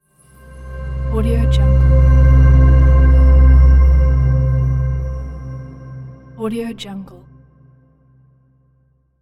dreamy pad ending

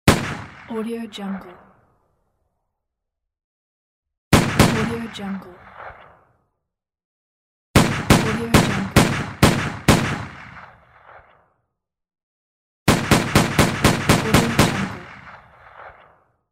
pistol shots

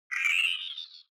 ui classic game start evil

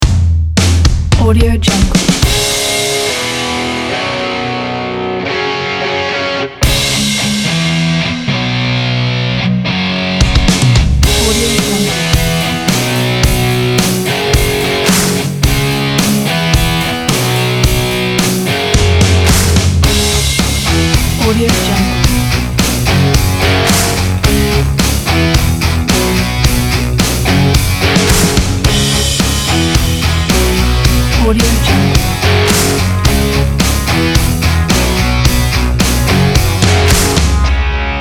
positive rock background

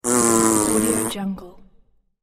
mouth fart

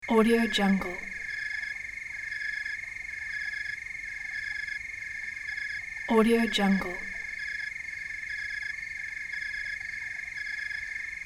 crickets chorus

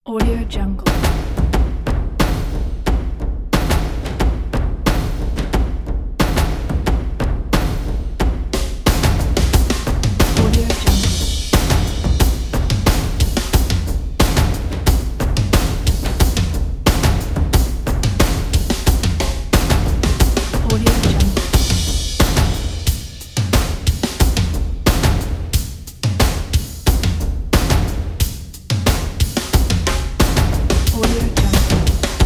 epic drum intro; fhr

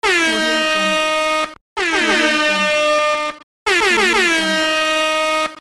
dj airhorn; pashastriker

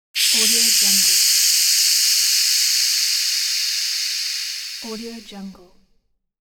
air pressure release

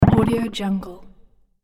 platform edge jump

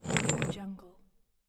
large rusty chain drag; stormwaveaudio

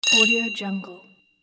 crystal

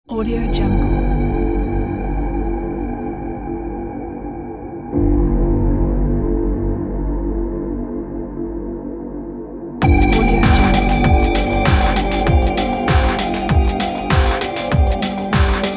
suspense

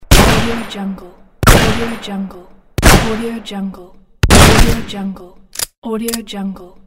pistol shots

